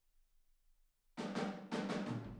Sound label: Boogaloo Intro Room ST, Drums, 82 BPM